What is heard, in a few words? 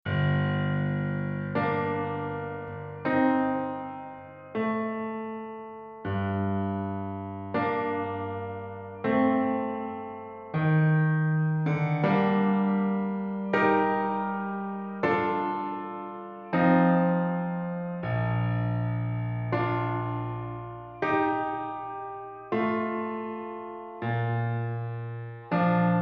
Preludes No.16 in Gm